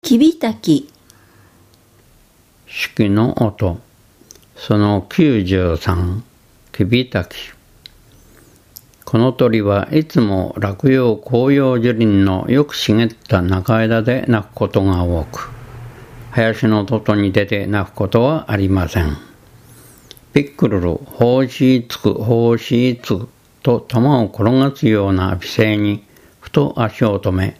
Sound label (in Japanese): kibitaki; sikioto